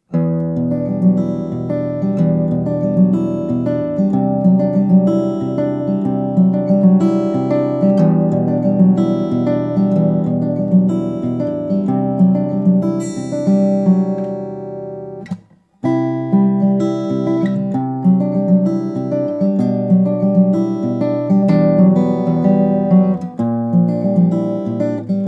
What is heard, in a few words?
emerald x20 artisan series woody amber, steel string guitars flattop